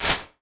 Reflexion Room, impulse responses, small spaces, rooms; Apple